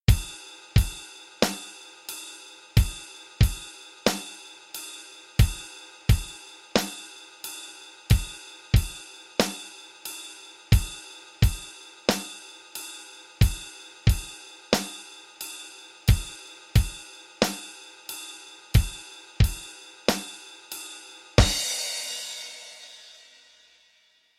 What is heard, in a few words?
rock basic, rock basic rhythmen